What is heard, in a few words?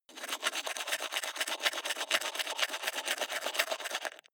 teeth brush wet open inward